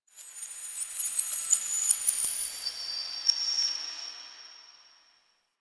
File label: Metal Whispers; Ambience; Warframe; The New War; Quests; Zariman